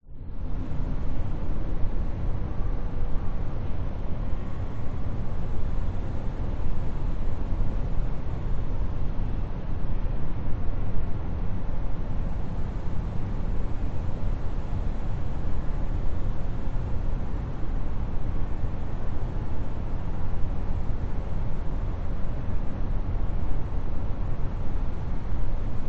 industrial warehouse abandoned drone wind distant traffic outside